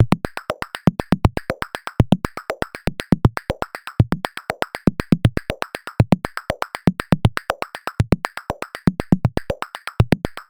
Jingle Gling Loop; WAVES Element 2

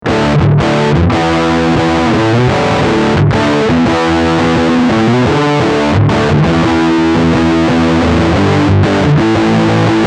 Fuzz

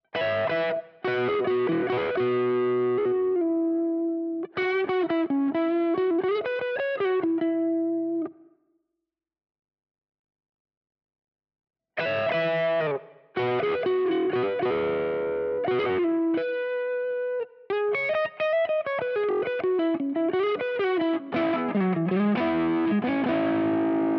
Jazzmaster crunch Lead

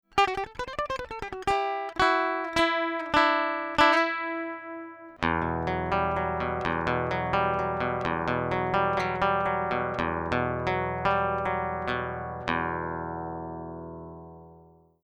Acc EQ; Ibanez

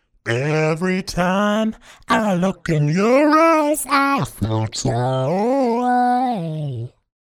Vocals Multi FX; Antares

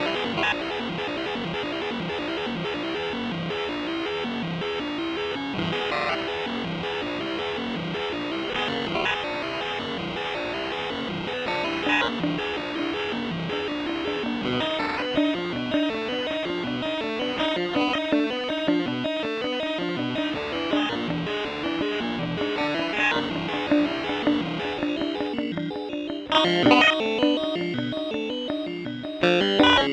Pitch Sequencer; Ottobit

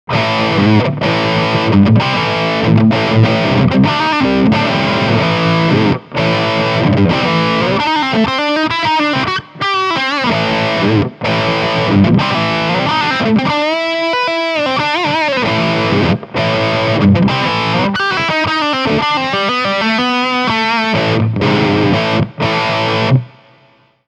Crunch Channel

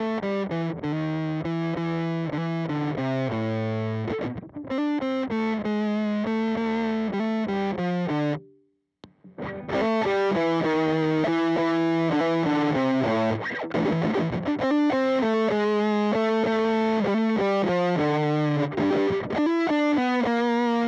Overdrive Stacking Booster Fuzz

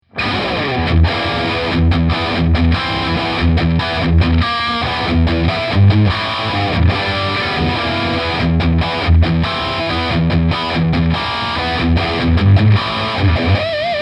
Boss Katana Artist MKII Lead

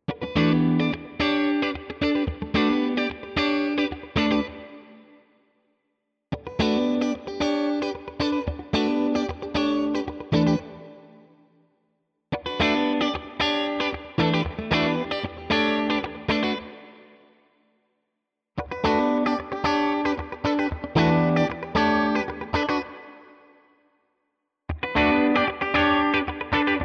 clean Groove all positions; GTRS

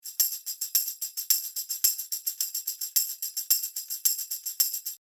Beyerdynamic M 90 Pro X Tambourin 30cm

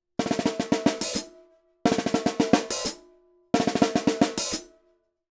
Snare Hihat; sE Electronics sE 7

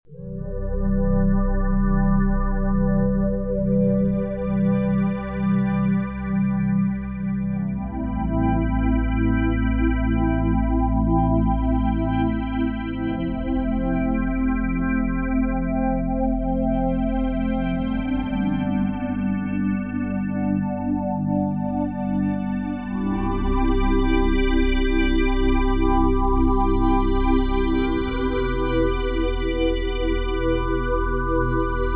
Wavetable pad

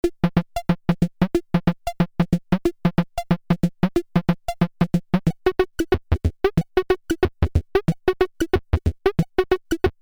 FM Source Jam; Doepfer Dark Energy III

